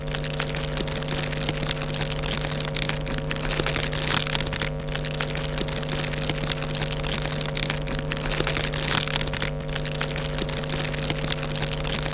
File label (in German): tuete